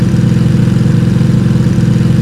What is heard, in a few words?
car highgear loop